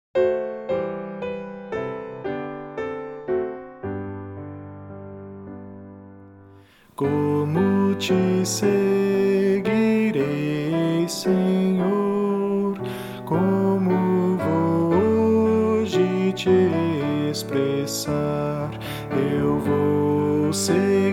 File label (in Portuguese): voz piano